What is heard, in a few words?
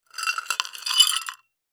filling a glass with ice